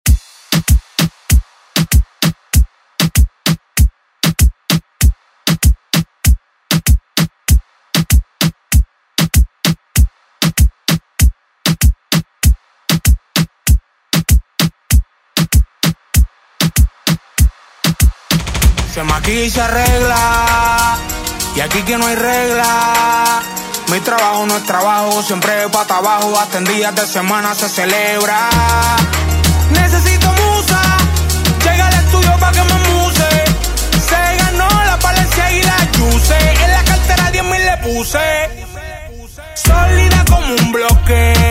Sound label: Hit Latin Urban Redrum; Deejay Hit